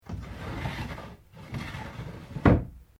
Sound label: Door sliding interior small cupboard screen close; Various doors; SoundEffects Foundation2